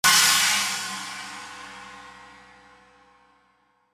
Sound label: cym